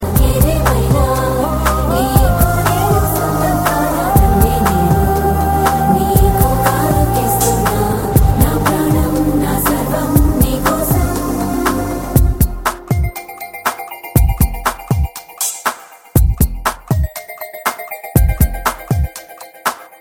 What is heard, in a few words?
Special Tone; Dookudu